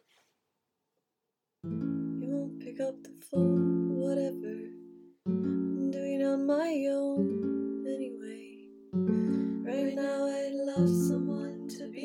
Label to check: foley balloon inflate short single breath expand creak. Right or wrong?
Wrong